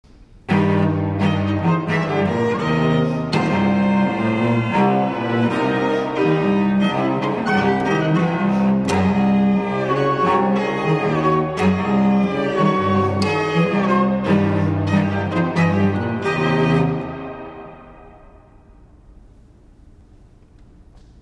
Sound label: Duo for Violin and Cello; Jeff Harrington